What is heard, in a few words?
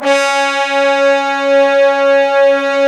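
low brass, fat pop section; Roland L-CDX-03 Disk 2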